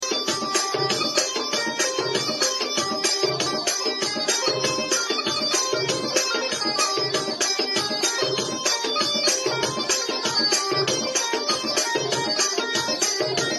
Lianic, ساز

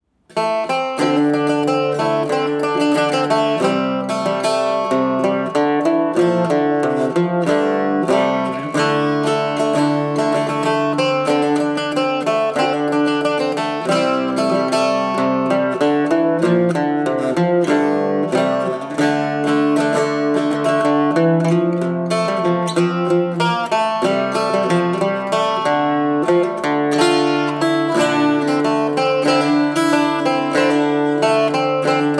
Bouzouki; ساز بوزوکی

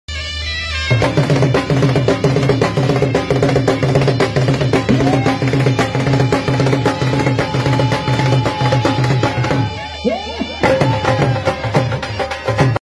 Indian drums; کوبه های هندی

